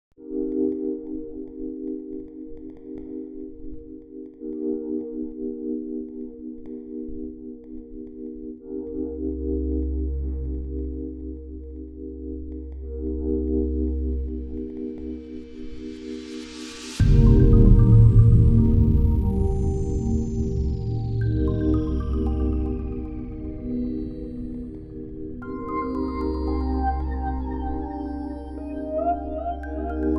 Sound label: tension; RPG Maker; Grimm's Hollow